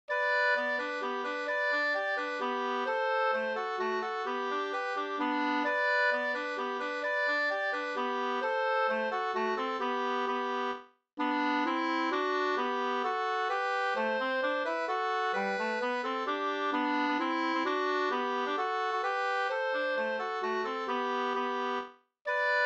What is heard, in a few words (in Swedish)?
folkdans Sanglek G dur; Skorda linet